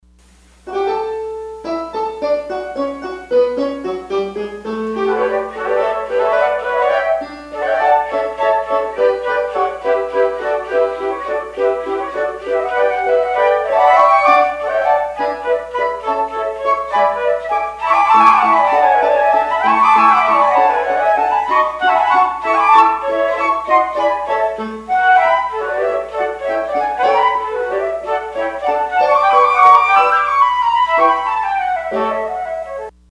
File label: scherzino 3 flutes